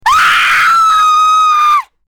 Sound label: scream in fear